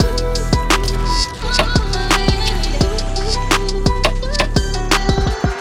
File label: Trap Before